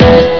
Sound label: Gtr, Instrmnt; Sound Library 2000; Wayzata Technology